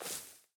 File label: bush small, walking, plant; Factorio